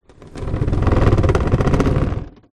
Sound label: household table plastic garden drag on wooden decking